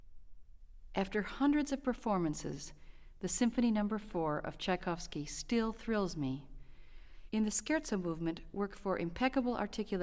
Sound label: Orchestral Excerpts For Flute